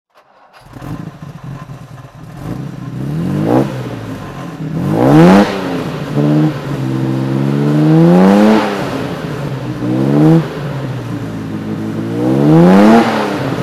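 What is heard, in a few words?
jaguar xk150 34litre throttle, Engine sound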